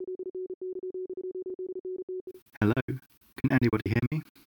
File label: midi gate fast